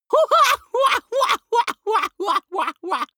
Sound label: laughter gloating; vocals; comedy-cartoon; soundpool